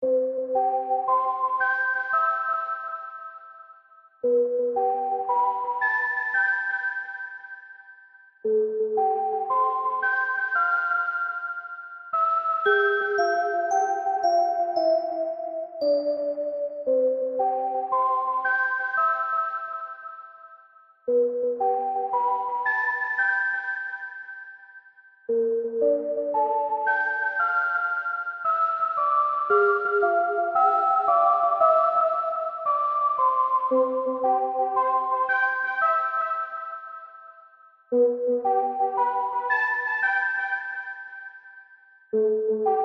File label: Rainy Sad; Button City; PS4; Xbox Series X/S